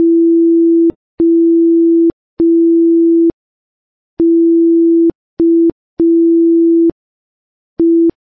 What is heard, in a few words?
Morse Code